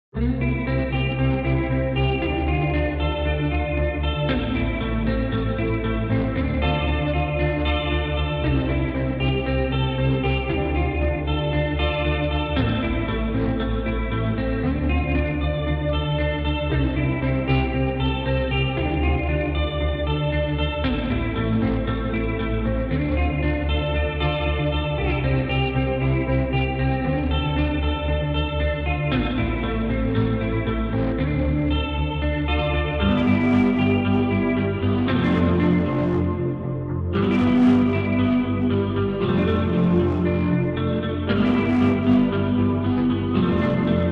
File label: INSTRUMENTAL